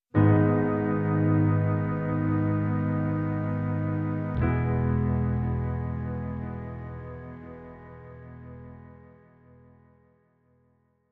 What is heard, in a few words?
Sting 2 (Ambient Ver)